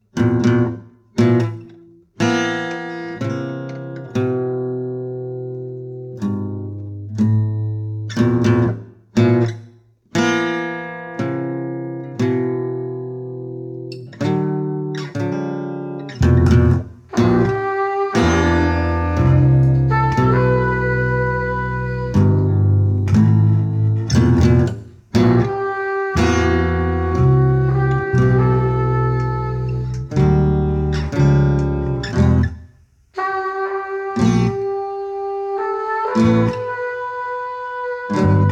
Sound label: Noise Rock